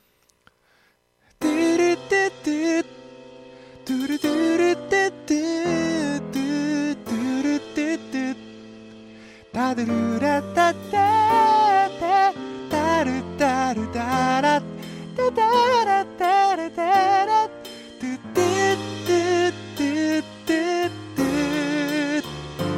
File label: start vocal